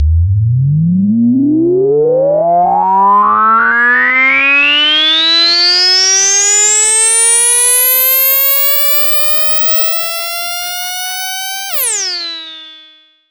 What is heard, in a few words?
SYNTH FX